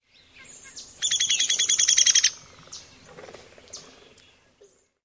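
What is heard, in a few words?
whinny call, Downy Woodpecker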